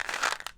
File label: Multivitamin Roll, pill bottles